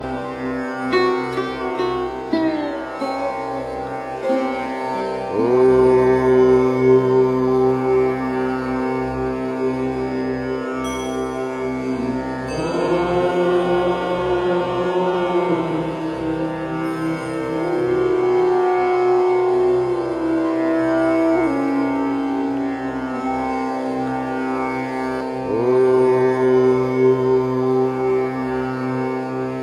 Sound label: Om Raga Puriya Dhanashree